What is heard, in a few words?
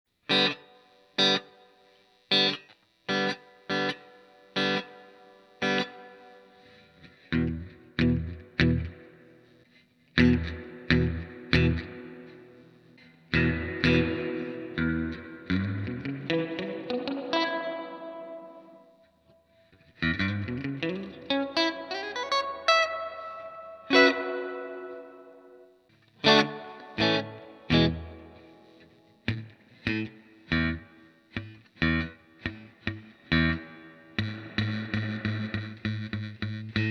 Guitar Reverb, Allen Encore Guitar Amp